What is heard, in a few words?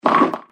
Bowling Pin